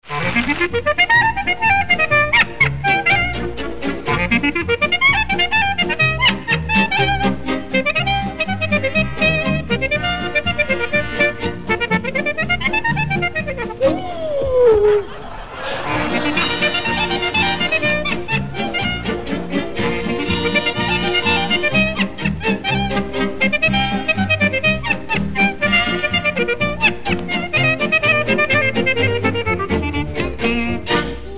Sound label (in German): das lachende saxophon